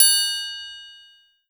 Bell Mid Bel

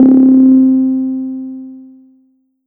Blip Random

